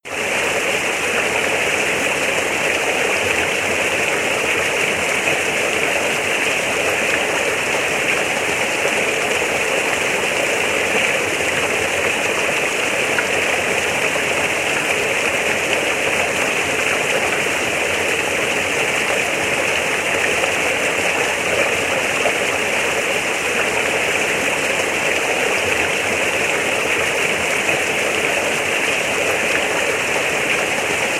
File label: Just Rain; Outside Broadcast Recordings